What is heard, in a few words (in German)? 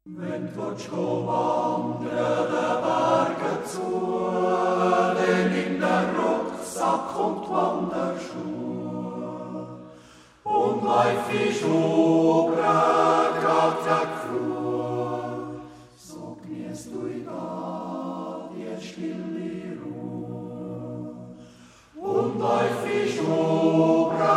jodlergruppe, musik; Schlieraetal; baergwanderig